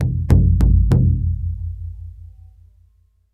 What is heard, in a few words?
Native Drum Quadruple Strike